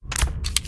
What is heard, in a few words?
laser holster